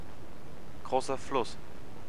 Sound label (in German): fluss